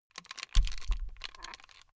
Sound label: plastic rattle slow short